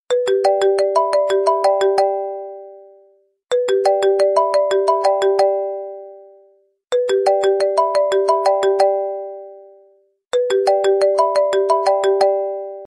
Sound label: iphone xi